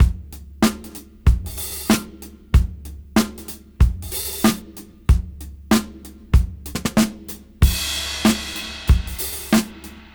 Funk 94 BPM; AMG - Keith LeBlanc Phat Butt Jams

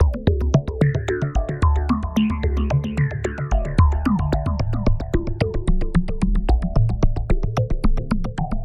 NRG Arp, trance

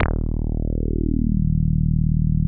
TB 303 BASS

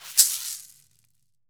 caxixi; Brazil

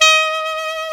G4 sax, soft sax; East West - Global Sounds

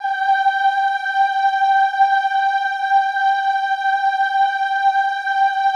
OOH G4, Classical Choir; Best Service; Extended Classical Choir

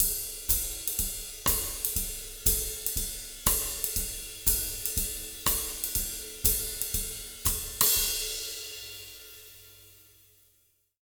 120 BBAND, Jazz Latin Drumloops

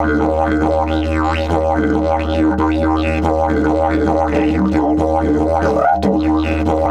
140, DIDGE RIFFS

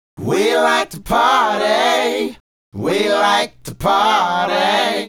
Male Group, Hip-Hop and RnB Vocals

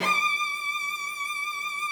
D6, cello vib; Xsample VOL-7

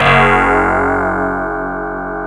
DS8 F#1; Back In Time Records; Korg Universe VOL-1

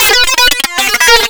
SYNTH SEQ; Big Beat Samples & More; AKAI; FX LOOPS KIT

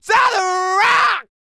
MALE HUMAN, R&B & Dance; ILIO; Vocal Planet VOL-4

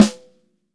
sonor snare

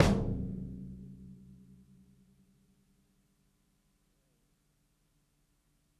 ROOMY KICK; Basement drums vol 2

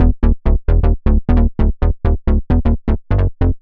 Bass 132 E, uk garage

